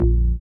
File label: ORG, Synth Bass, Roland